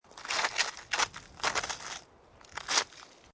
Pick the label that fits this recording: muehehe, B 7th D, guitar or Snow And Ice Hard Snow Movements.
Snow And Ice Hard Snow Movements